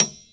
STACK PIANO; AKAI S6000 CD-ROM - Volume 1

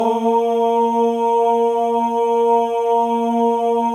MALE OHS; Spectrasonics; Symphony of Voices

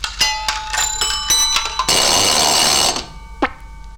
FX; AMG Megabass REMIX! VOL-2

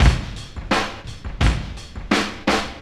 Breaks 170, Drum&Bass; EZ Rollers

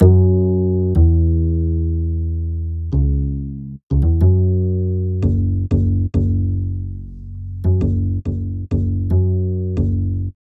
Upright Bass, Cinematic Funk; Sonic Foundry (Sony Creative Software); Cinematic Funk Urban Tone Poems

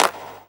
clap; CLAP-HIP; Best Service; Dance Mega Drums

